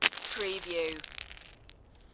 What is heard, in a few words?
Snow and Ice, Ice fragments falling and sliding with long tail